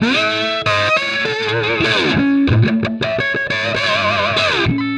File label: BLUES GUITAR